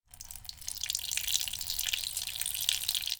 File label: Dog urine; anemoi